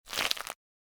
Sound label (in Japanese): zonbi